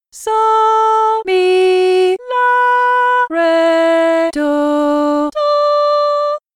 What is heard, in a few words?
Easy Melody Solfa